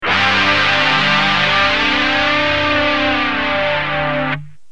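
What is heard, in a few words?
guitar, flanger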